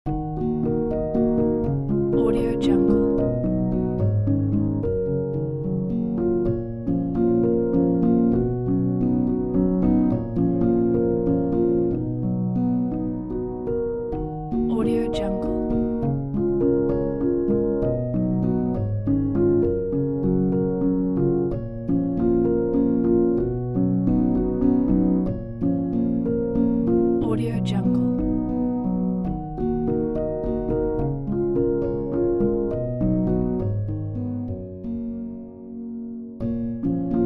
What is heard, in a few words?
memory on piano; new-hansen